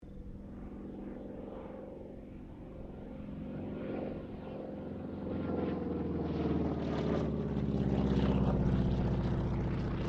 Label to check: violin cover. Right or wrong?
Wrong